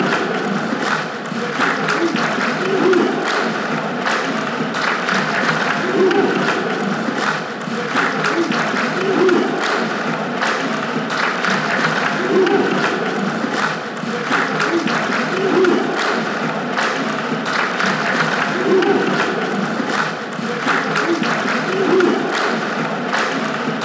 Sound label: clap come on, fanchants